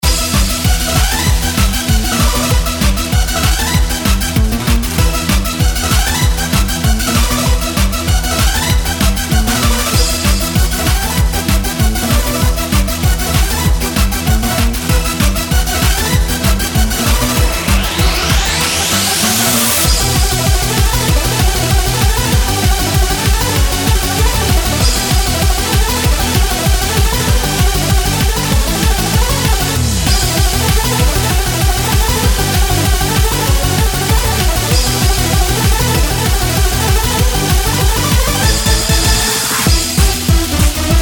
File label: BGM Fast; Gremori; closers